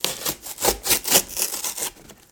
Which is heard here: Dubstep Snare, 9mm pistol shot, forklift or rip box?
rip box